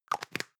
plastic can lid screw close hard long; objekte; dose

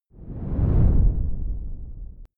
whoosh simple low grainy

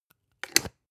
spice jar big scratch close hard; industrie wirtschaft; gastronomie

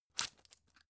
drug pull out hard; berufe; arzt

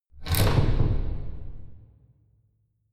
church door key

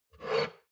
shot glass grab table slide medium; berufe; soundpool

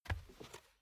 step barefoot wood plank sneak single left; schritte

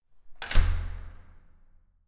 entrance door slam

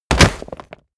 body fall concrete leather hard; nahkampf; soundpool

